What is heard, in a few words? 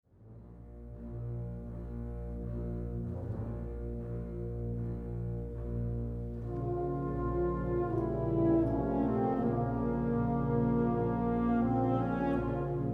Slavonic March